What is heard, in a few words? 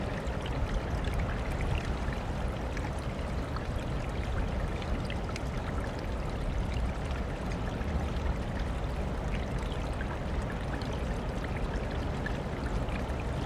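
Natural soak ocean and bird call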